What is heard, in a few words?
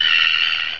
pain, headcrab poison; hl2